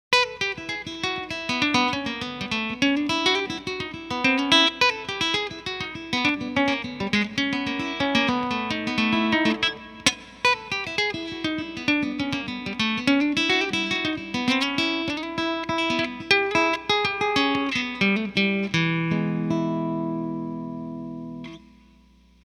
nylon electric; Silkyn Tube Amp

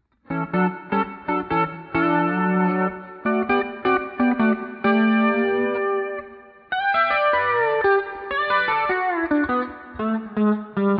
Flanger, ToneX Pedal

